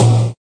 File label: TOM, Volca; drums; hip hop; WallsVado Volca Sample Pack